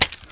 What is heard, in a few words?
Key Type Shot Strike Typewriter